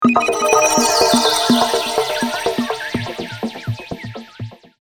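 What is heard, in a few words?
phone Message Alert; LG; PRADA phone 2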